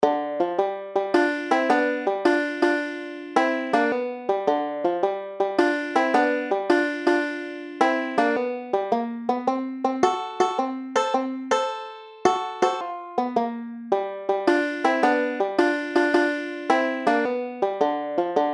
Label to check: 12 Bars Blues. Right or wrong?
Right